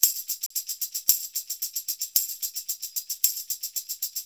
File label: Tamb; Soundscan vol.56; Modern Percussion Loops; AKAI